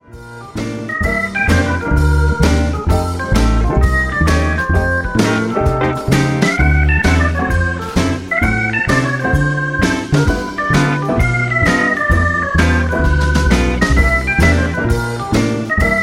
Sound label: Jazz swing